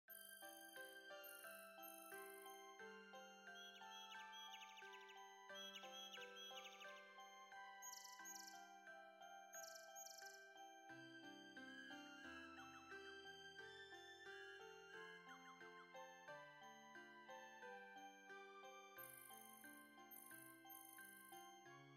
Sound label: birds, La Brière